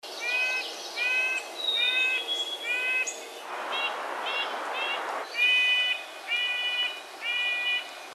nuthatch red breasted; species/birds; wbba

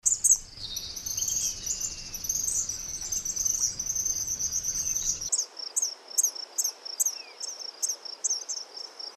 KINGBIRD EASTERN; species/birds; wbba

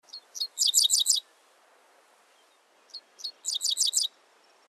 DICKCISSEL; species/birds; wbba

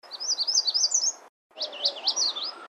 WARBLER MAGNOLIA, birds; species/birds; wbba

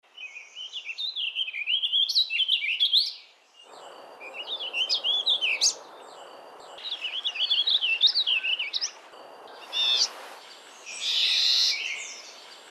vireo warbling; species/birds; wbba

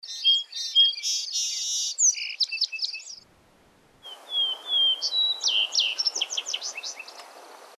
SPARROW VESPER, birds; species/birds; wbba

